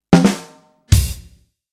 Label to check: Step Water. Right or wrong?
Wrong